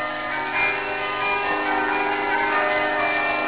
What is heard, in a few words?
carillons, bell; bournville